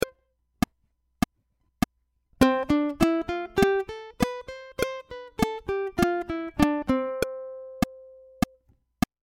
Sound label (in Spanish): C Escala Completa Lento Back Click